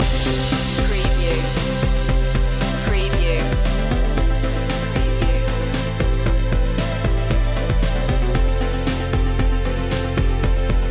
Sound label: future technology loop